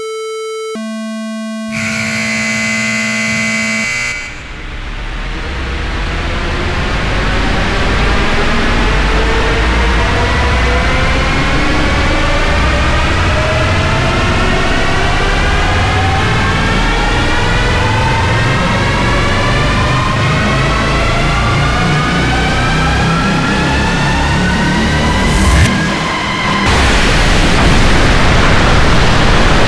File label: Massive Laboratory Laser Explosion